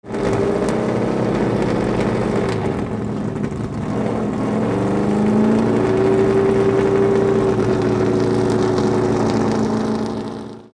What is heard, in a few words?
nascar pontiac rev